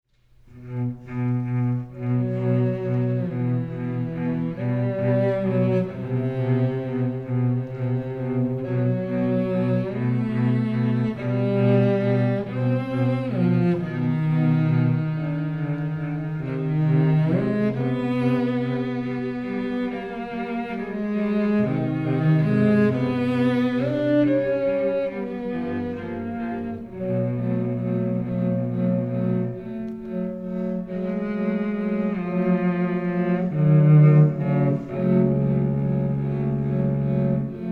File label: five duos for two cellos andante